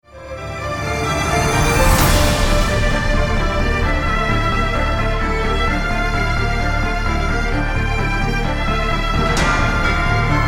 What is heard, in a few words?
Strings